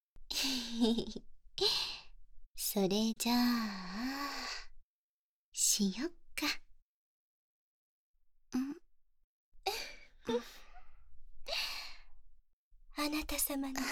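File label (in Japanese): 乳首舐め+フェラ、アナル舐め（バイノーラルマイク 効果音あり）; 3P; 天使と悪魔の乳首舐め+フェラ、アナル舐め+本番、本番+乳首舐め…お掃除フェラ×2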